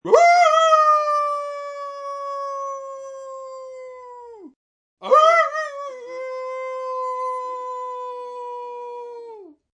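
Wolf Howl, Wolf howling (like a dog) (human voice); Animal; Wolves (Sound Effects:Animals); VoiceBox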